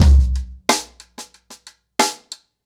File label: 90BPM, ACOUSTIC DRUMS